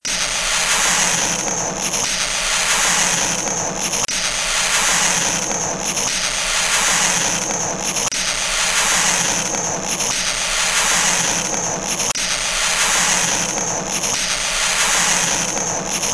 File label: blackboard nails